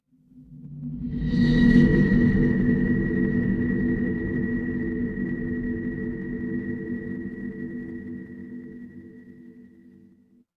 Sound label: horror stinger